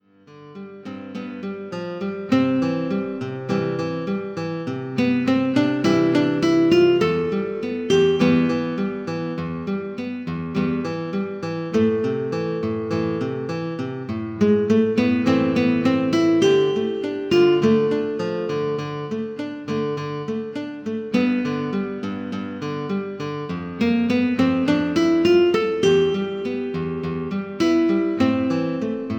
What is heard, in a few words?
synth